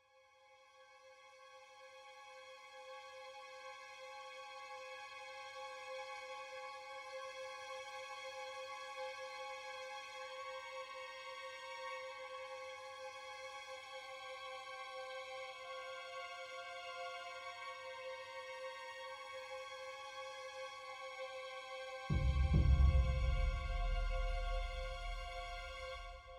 String; String Thing 3

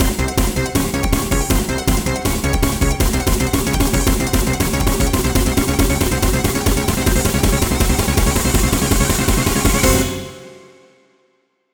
Drowning; Sonic Robo Blast 2.2

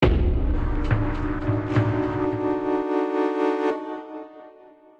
Defeat; WrestleQuest

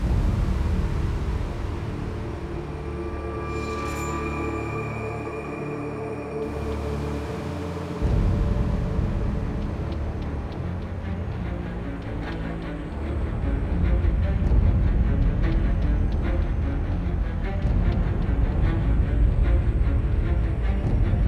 Dialogue Tension; The Dark Knight Rises; Android, iOS

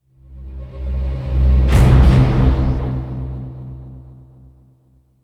Side Case End Serious; Lost Judgment

